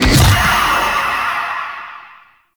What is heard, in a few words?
Wraith Pre Death; Enemies; Warframe; Zariman